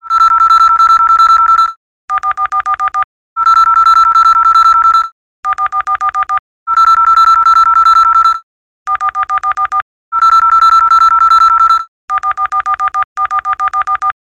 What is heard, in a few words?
Alarm New Nokia